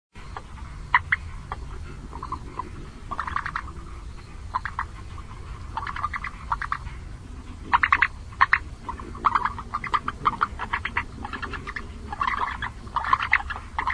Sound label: long-legged frog